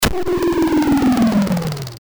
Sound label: lose; Flare3D 2.7.1; physics